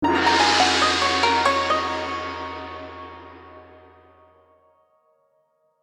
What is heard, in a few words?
Chinese transition cartoon asian inspired